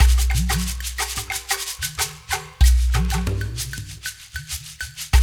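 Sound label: 93 bpm, earth beat; Spectrasonics - Supreme Beats - World Dance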